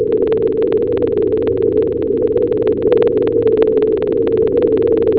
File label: ship passing over phone; acoustics; env-noise